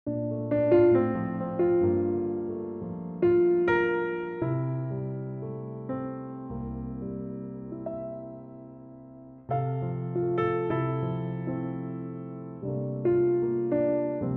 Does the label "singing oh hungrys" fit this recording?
No